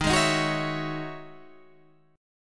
E Major 13th chord

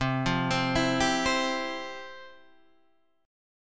F Major 7th Suspended 2nd, C, guitar

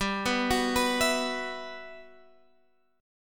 B Suspended 4th, G, chord; arpeggiated; Bsus4; JGuitar

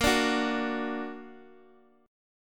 A sharp Augmented Guitar Open D

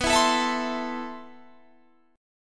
A Minor B, guitar; Am/B strummed

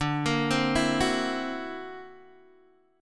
D Augmented 9th down Guitar DADGAD

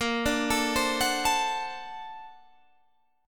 A sharp Augmented Major 9th, chord; arpeggiated; 18,17,19,17,19,17; JGuitar

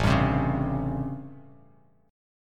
A 7th Sharp 9th, Bass Standard

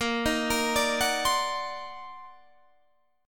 Eb Minor Major 13th Bb

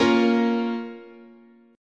Bb Minor, guitar chord

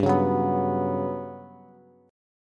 G Major 7th Flat 5th, Bass; strummed; JGuitar

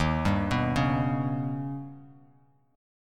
Eb Augmented 7th, Bass Standard; arpeggiated; 11,10,9,6

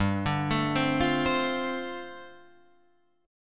G 7th Suspended 2nd Suspended 4th, Guitar Open F; G7sus2sus4 arpeggiated

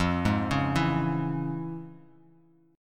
F Minor Major 7th Sharp 5th, F, Bass Standard; FmM7#5 arpeggiated; 13,11,11,9

